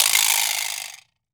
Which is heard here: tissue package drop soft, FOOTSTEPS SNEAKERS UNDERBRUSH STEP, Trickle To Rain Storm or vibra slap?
vibra slap